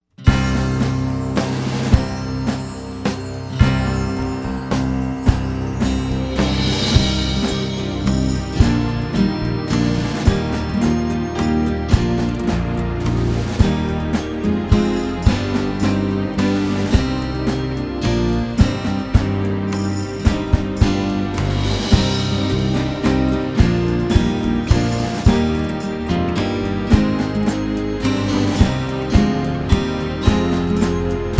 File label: solo accompaniment; pirates of the caribbean